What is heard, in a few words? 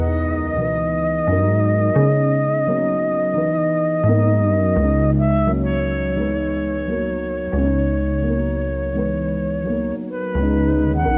sax and keys, sad; loops